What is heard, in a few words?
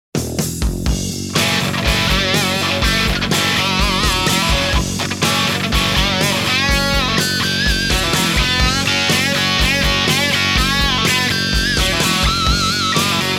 Gibson Bassman Heritage